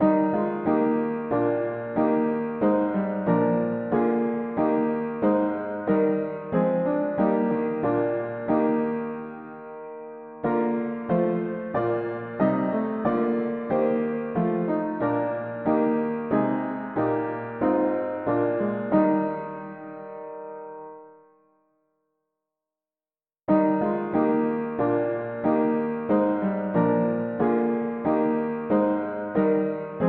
mono piano, hymns; Thy suffering love Lord Jesus

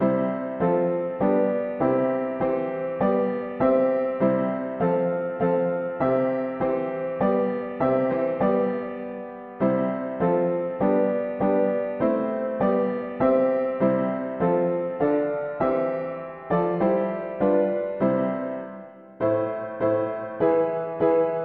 mono piano; hymns

